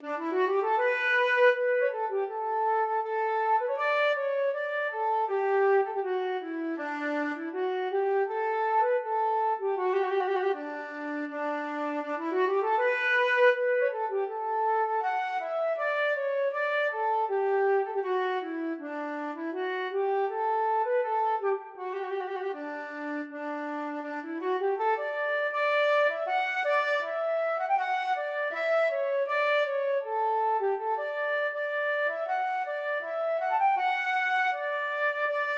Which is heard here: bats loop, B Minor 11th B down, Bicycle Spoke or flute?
flute